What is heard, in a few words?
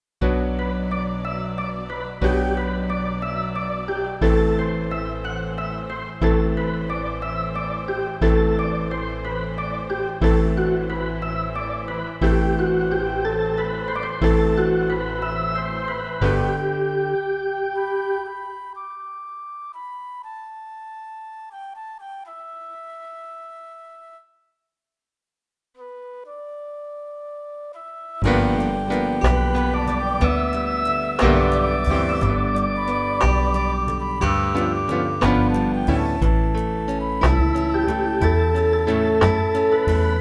G major flute music